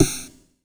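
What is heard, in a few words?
house snare drum; USB Soundscan vol.11; Drums Dance & Groove; AKAI; HOUSE KIT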